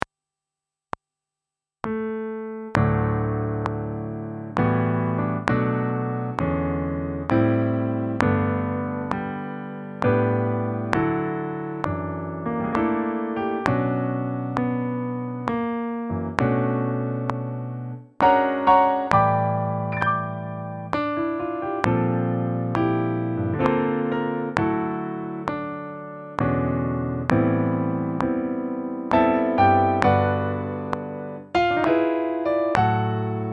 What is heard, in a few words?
Amazing Grace D dur, gospelpiano